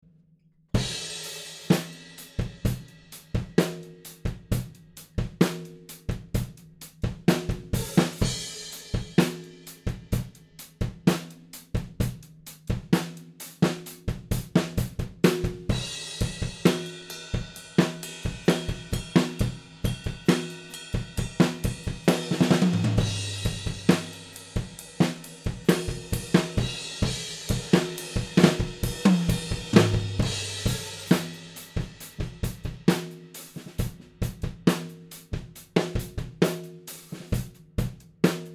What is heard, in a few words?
Drum Test